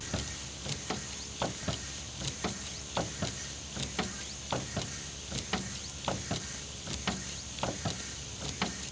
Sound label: wiper, busses; WAV Sound Effects; transpo